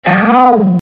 owwww

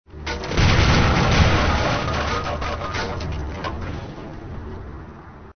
dumpster crash

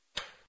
lock

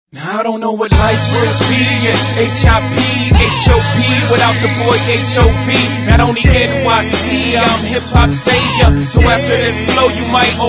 hip hop

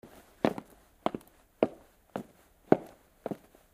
footsteps

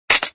fork drop

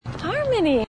harmony

